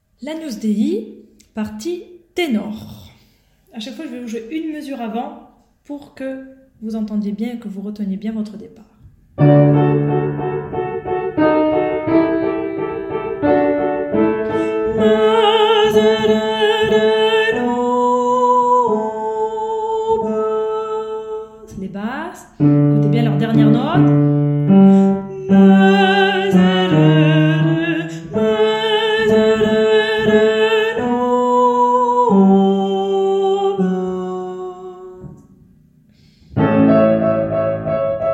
partie tenor; Agnus dei

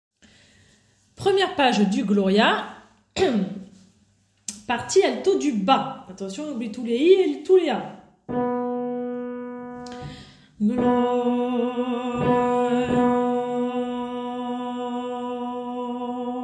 Gloria alto bas

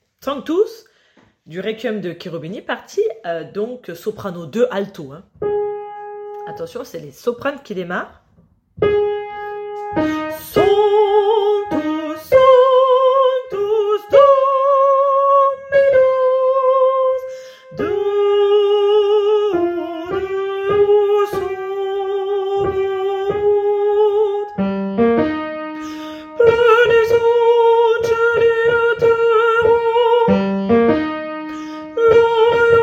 Sopane 2 Alto

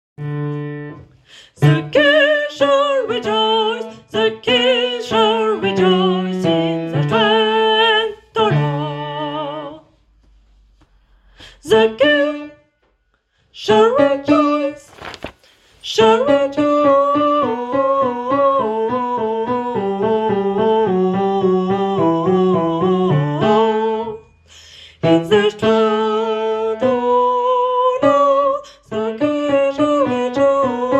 partie basse

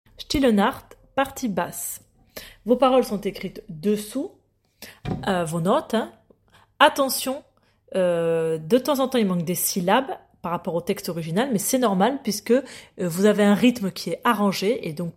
Silent Night partie basse, choeur; Still nacht

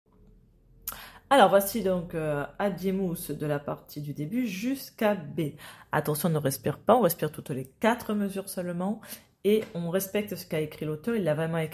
partie alto

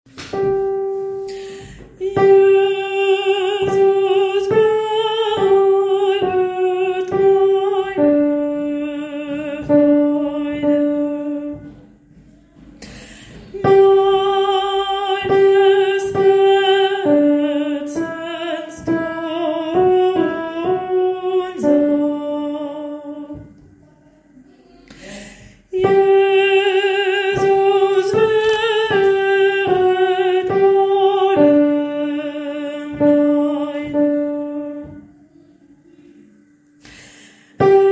partie alto; Bach cantate jesus bleibet

